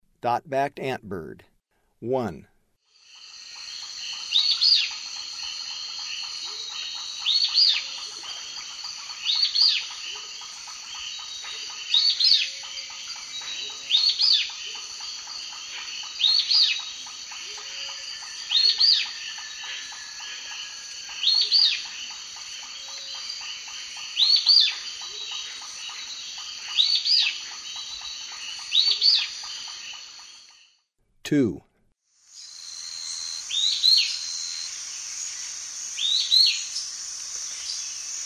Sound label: Dot-backed Antbird; S. America; Eastern Lowlands